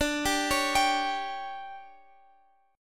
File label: D Major 7th Suspended 4th, chord; DM7sus4 arpeggiated